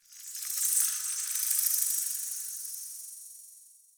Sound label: Insect Day; Eidolon Plains; Insects